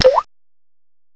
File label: DRIP; M8; CMI Factory